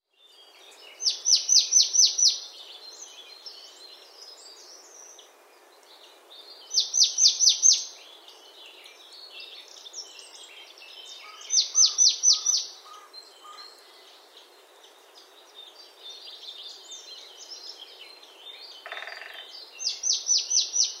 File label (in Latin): Poecile palustris; SETE